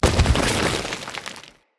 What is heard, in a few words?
Root Spider Unburrow, Foley; Animals